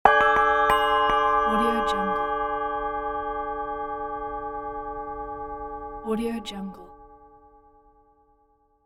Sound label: tibetan singing bowl